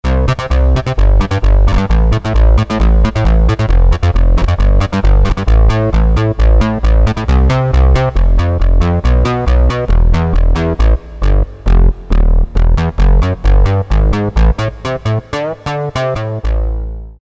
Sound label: Syn BASS, Korg DSS-1